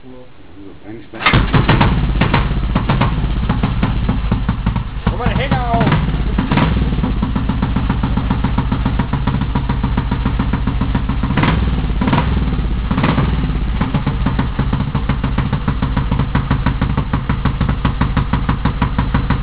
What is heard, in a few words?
Royal Enfield